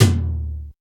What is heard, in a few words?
Tom, Drum One Shots; Coldcut's Kleptomania VOL1